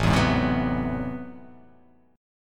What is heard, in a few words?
A Minor Major 13th, down